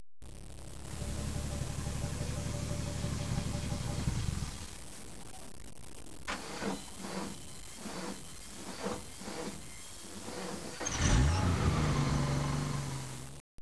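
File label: TRAFFIC, car sounds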